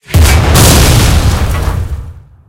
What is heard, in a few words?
tank hit aphe mid; tanks; War Thunder